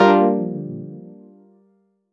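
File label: Gm7 chord; Movemento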